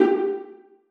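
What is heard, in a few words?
violas pizzicato f#3; Sonatina Symphonic Orchestra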